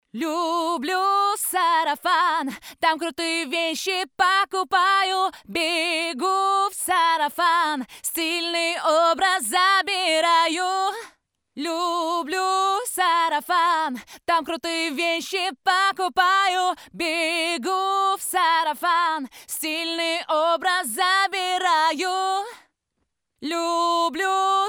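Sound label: vocal; Sarafan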